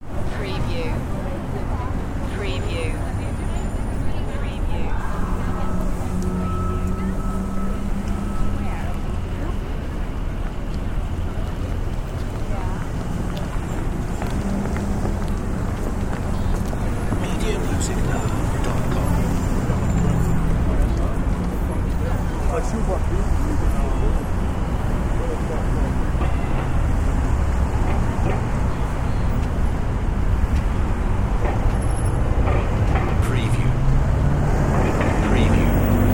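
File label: City Ambience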